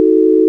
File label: sine; ものづくり基礎工学（情報工学分野）; 音の波形と分析